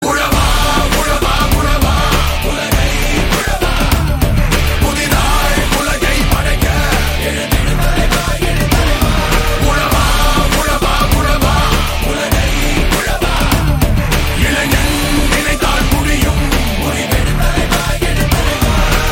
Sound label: Karaoke Ringtone; Bhoomi